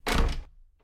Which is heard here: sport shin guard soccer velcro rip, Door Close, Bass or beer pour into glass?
Door Close